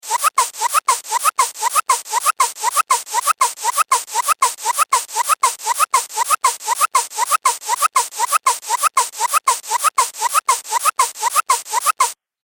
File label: Cartoon Repetitive Pump